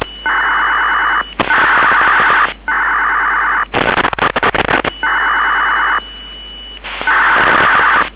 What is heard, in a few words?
mpt1317, scanner